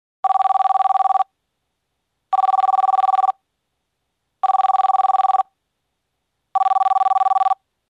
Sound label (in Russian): zvonok telefona zvuk